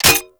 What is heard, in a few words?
Low Clip; Warframe; Scaldra AK